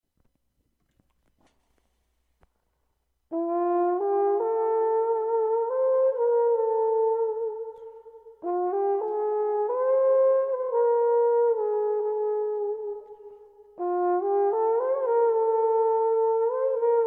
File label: Jazz Fantasy For Tenor Trombone